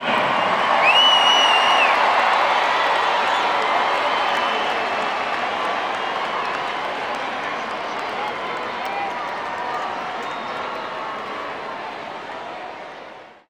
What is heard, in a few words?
Baseball Crowd Reaction; Crowds